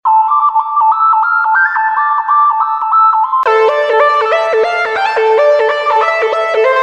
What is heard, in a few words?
Cosmic Sms